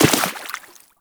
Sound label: Walk Water, footsteps; Warframe; Characters; Operator